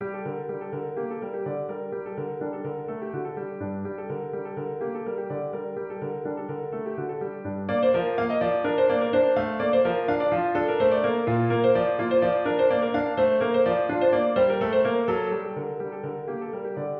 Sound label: Six Morceaux Musicaux no1 in G minor